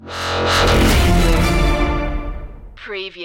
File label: hybrid orchestra stinger